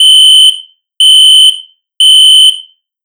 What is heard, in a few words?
fire alarm synth